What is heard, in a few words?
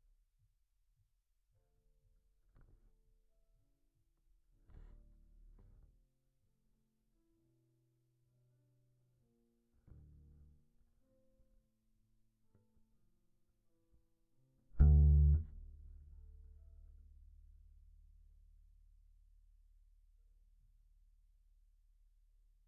Bass DI